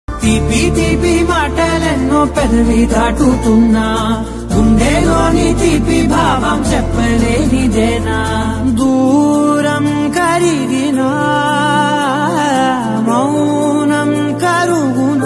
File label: telugu love songs